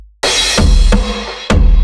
DARK DRUM 130

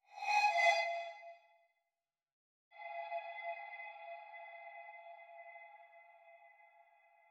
UFO

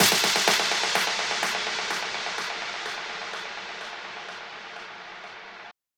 126 Down Snares Fx

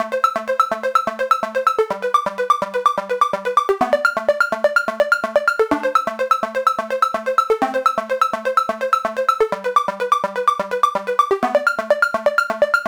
C High Arp Dry 126 A

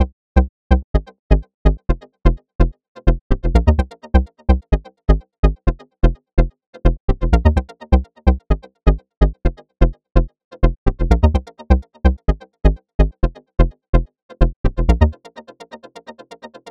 127 Cm Bass FX & Tail

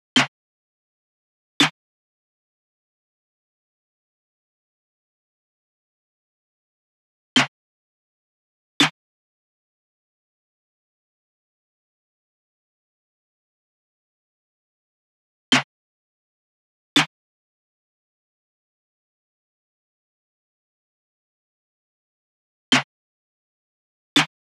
Rises BPM125 Chop Snare

